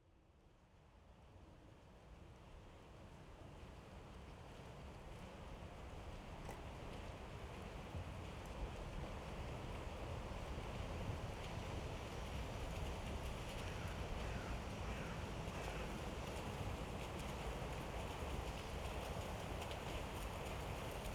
SWIMMERS SWIMMING CONTINUOUSLY ANGLE ARRIVAL, Water Rush; Red Libraries